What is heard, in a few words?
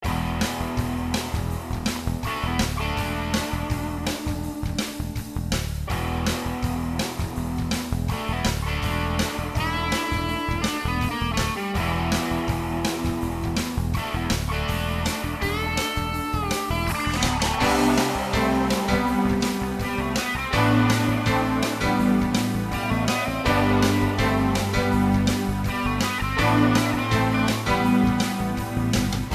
Menu Music